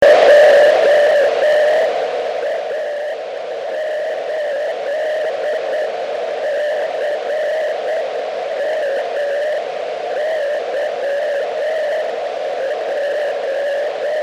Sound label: rcv signal